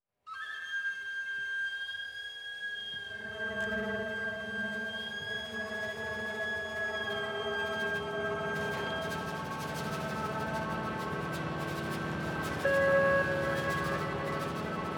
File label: Fragmentation Orchestra; Stretched